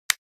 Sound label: balloon; Golden Crayon Sounds